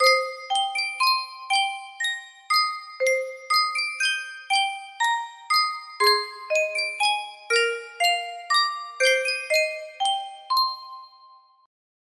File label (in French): sankyo boite a musique